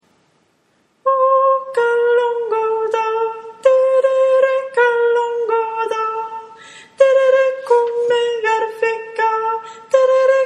tenor; calango

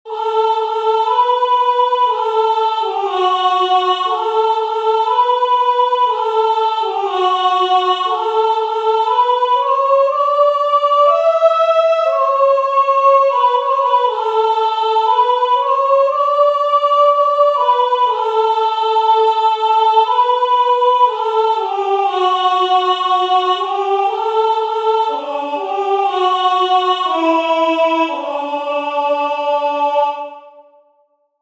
soprano; We shall overcome

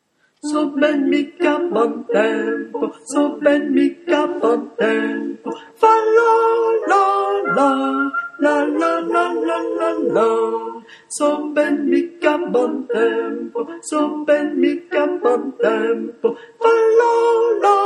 basses; So ben mi cha bon tempo

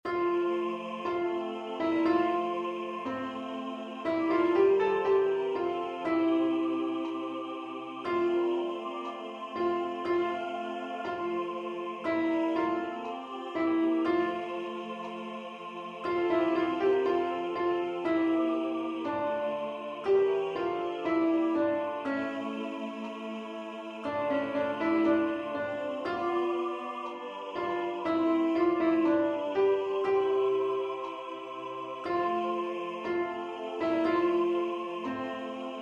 Whole Tone Lower Alto Piano

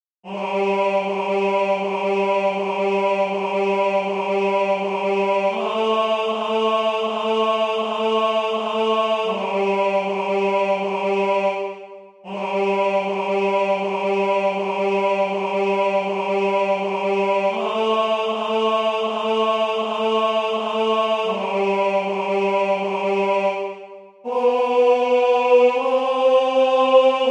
tenor

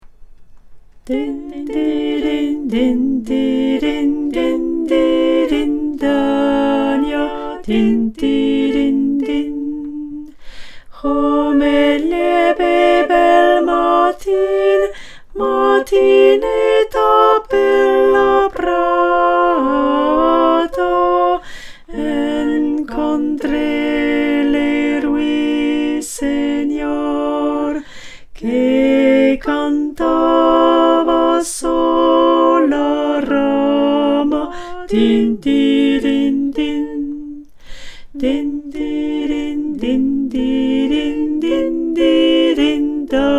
Alto

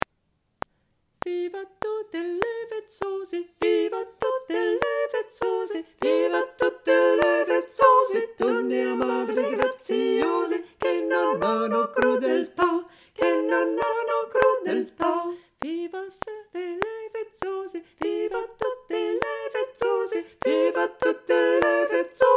Tutti; Viva Tutte le Vezzose

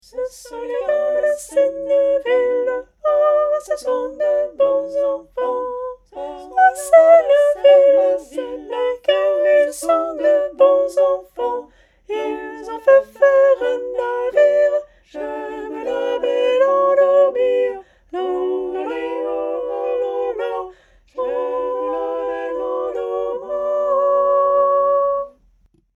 Tenor; Les Gars De Senneville

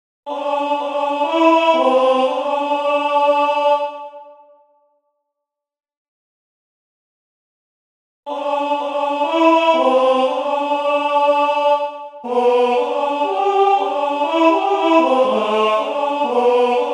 alto; NoËl Polonais

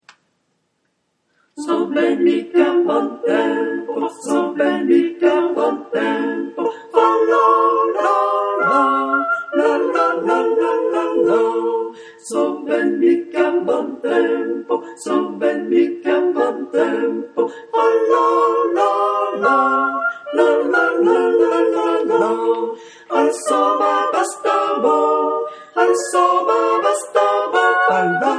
tutti; So ben mi cha bon tempo

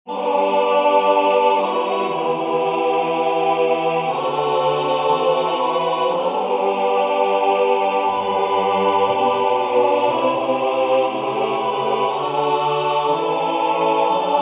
tutti; Freedom; everybody sing freedom

